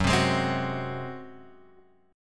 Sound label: Gb Suspended 2nd Flat 5th, Guitar, Open G; down; chord shape